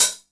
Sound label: 909 OHH; Wizoo; Platinum 24 Electronic; HHAT